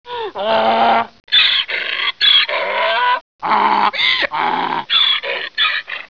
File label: donkey